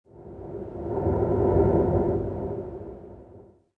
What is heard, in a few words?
WIND, wind